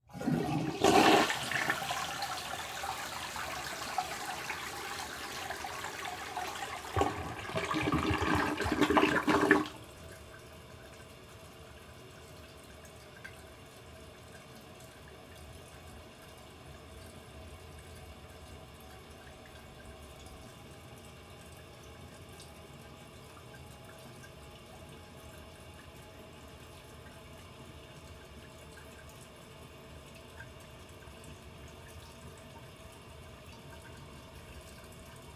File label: Toilet Flush And Running, Household